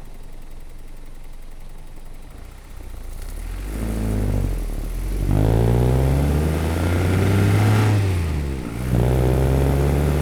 odd noise when cold only light load accelerate then cruise